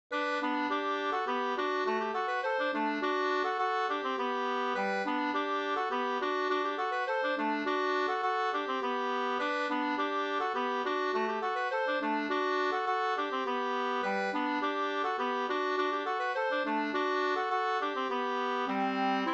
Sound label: polka; fr Yxlo e Albert Sundstrom Sd 2st